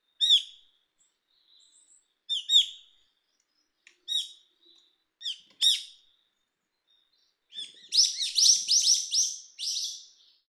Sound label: hiyodori, birds